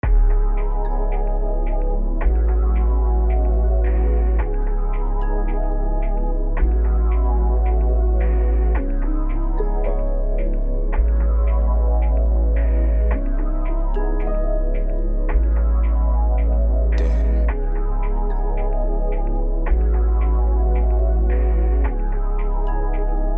RB Melody Loop Cmin 110 BPM

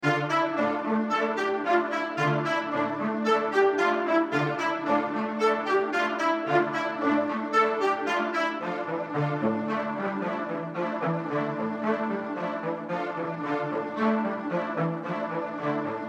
String Loop Dmin 112 BPM

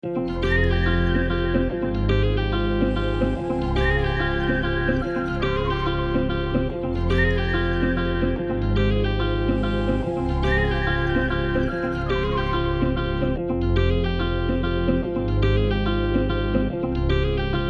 F min 144 BPM Guitar Flute Bass

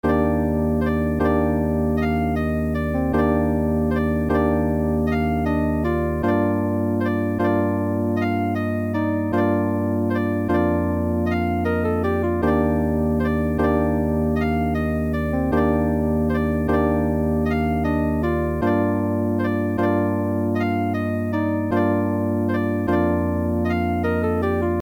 Lofi Dmin 155 BPM

